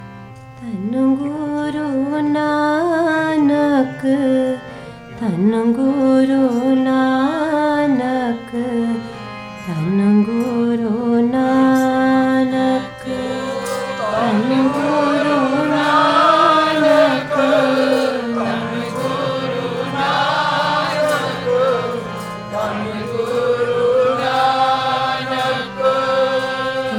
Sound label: Kirtan; Parbrahm Parmesar Swamee Dukh Niwaran Narainay; New York Samagam